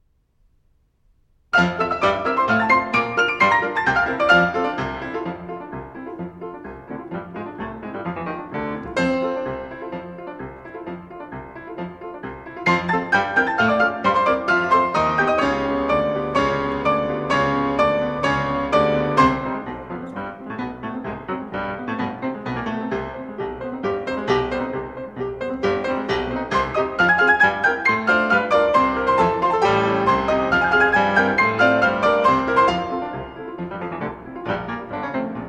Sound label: Grande ritournelle (Piano 4-Hands Version); Satie; La belle excentrique No. 1